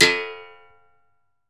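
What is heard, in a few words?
disk string, berimbau; Berimbau Xswitch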